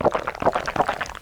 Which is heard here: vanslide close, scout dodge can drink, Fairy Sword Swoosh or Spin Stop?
scout dodge can drink